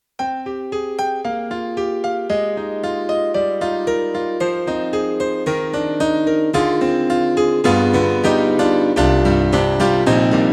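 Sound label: YAMAHA MU1000EX Reso Bright Piano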